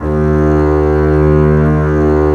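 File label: bass D#2; Digital Sound Factory Virtuoso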